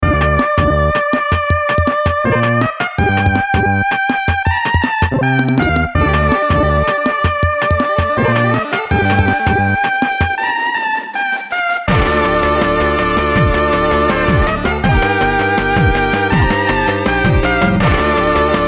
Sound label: chiptune